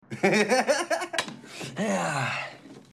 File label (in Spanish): risa